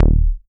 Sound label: short bass; Masterbits; Dance Trax